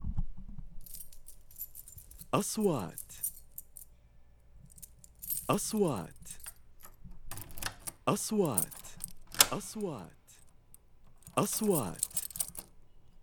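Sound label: keys iron metals noise plumbing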